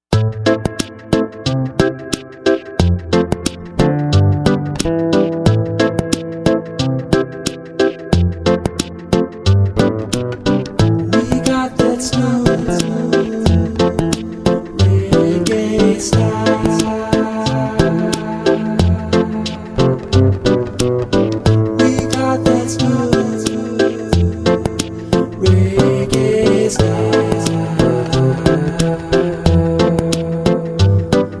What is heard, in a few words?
Smooth Reggae Style